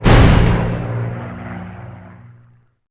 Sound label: Fighter Hurt; Civ4 warlords